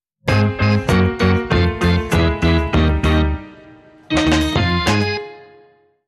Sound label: Jelly Reggae